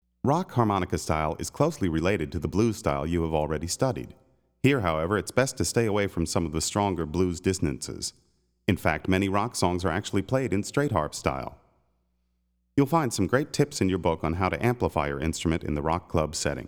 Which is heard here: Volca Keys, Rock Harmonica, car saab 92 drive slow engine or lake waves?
Rock Harmonica